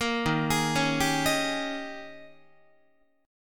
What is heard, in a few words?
Eb 11th, Guitar; 4A,4E,5A,5D,5G#,6C#; arpeggiated; 13,11,11,11,11,14